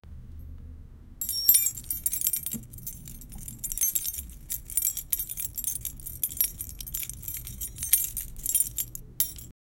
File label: sound to play when all, collect